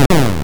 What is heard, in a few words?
shoot, attack sound; カタナ１